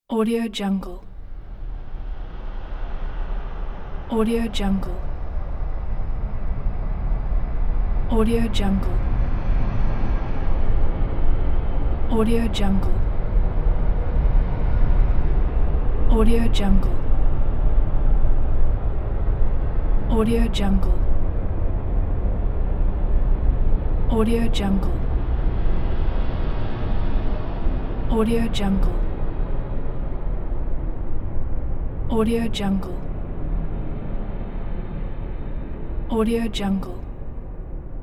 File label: cinematic drone transition